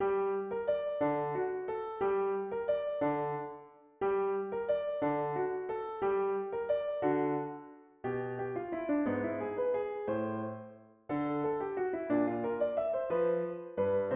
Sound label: little waltz